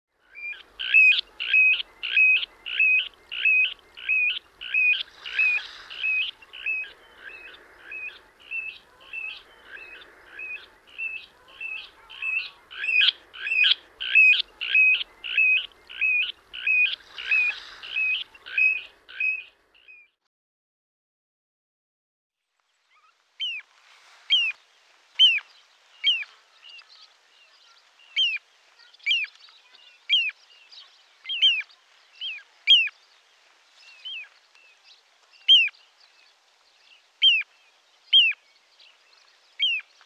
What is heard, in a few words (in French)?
Charadriidés Charadrius dubius Petit gravelot